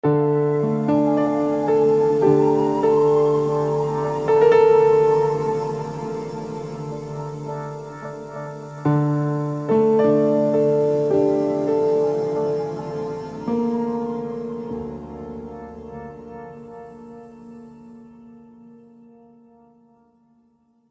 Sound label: FLUTE INTRO